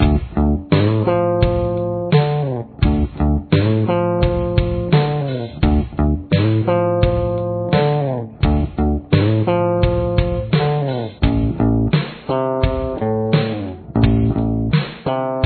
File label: verse bass